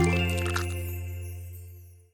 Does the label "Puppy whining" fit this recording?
No